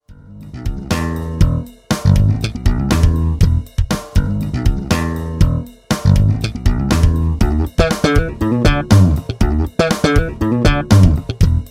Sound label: funk